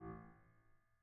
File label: harm A1, Grand Piano; bitKlavier Grand; Piano Bar